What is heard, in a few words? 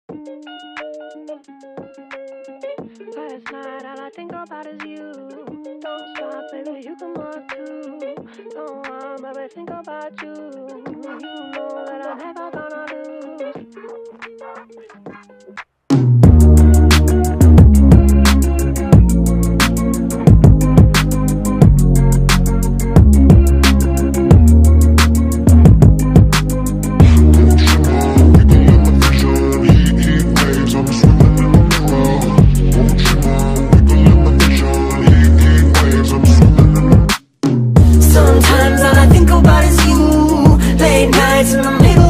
sped up nightcore